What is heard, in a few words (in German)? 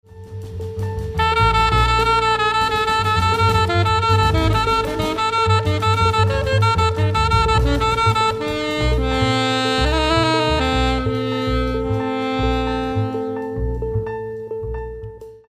viertelton git piano dm